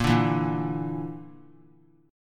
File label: A sharp Major Flat 5th; strummed